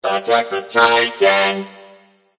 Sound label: vox attack; smite; ganesha